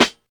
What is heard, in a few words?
good snare one shot b key, acoustic snare tuned to the B note